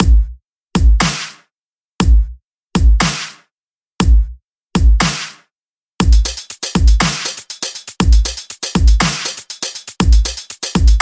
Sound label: Credit Song